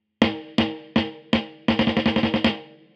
snare; Valves

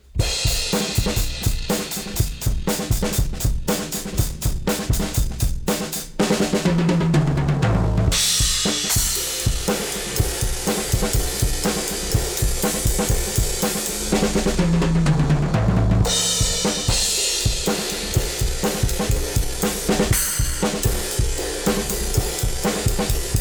drums Kit Smash

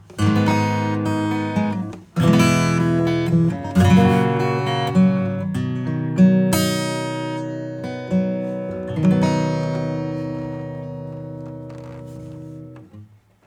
acoustic Acoustic

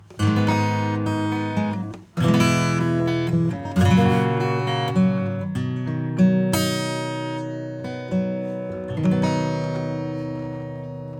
acoustic EQ bypass

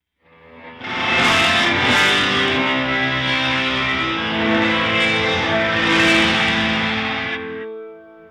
guitar; PHYSION Mk II; Ghost Guitar

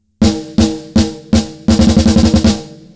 snare Rock Full and Open; Joe Chiccarelli

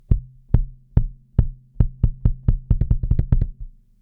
kick; Acoustic mood

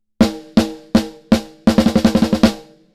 snare; SplitEQ

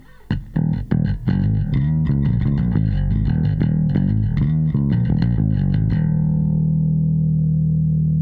bass preset Bass Comp; Xtressor

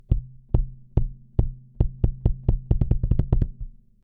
kick bypass; Purple 3

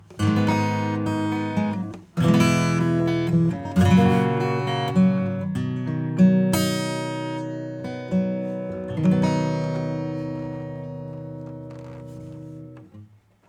acoustic; Seventh Heaven Professional

